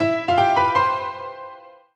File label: Solo Piano Positive; MUSIC EFFECT; startide